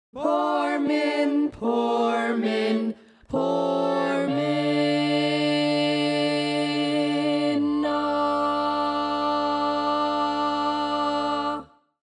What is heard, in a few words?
Bass, barbershop tags